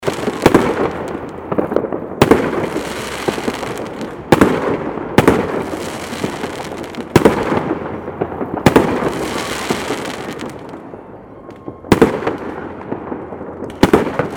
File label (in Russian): zvuk fejerverka